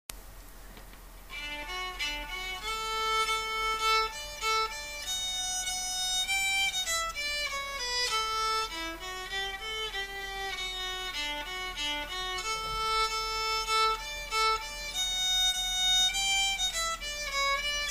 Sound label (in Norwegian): førstestemme normal